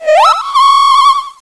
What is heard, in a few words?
Singing